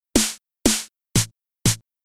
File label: Rhythm House SD